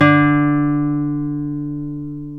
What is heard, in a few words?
Guitar Classical D3, Nylon String; Roland LCDP02 Guitar and Bass